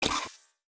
Menu Install Mag; Custom UI Sounds; Call of Duty Modern Warfare (2019)

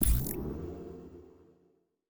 Menu Weapon Assemble; Custom UI Sounds; The Division 1

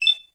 Dialog Button Hover; Custom UI Sounds; Fallout New Vegas

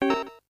Menu Dropdown; Custom UI Sounds; SEGA Dreamcast

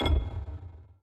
Button Bottom Bar Click; Custom UI Sounds; Dying Light

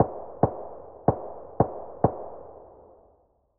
adar tap fast, weapons; SPT Battle Ambience

